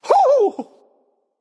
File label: grunt hit; smite; ra